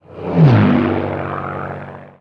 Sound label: plane